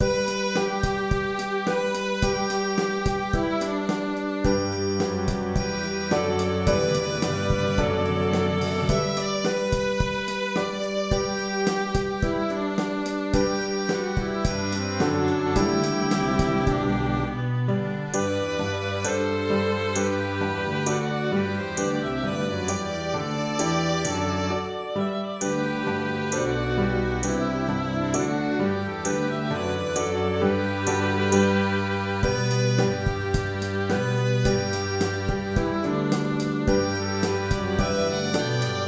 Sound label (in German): Streicher; Swing low, sweet chariot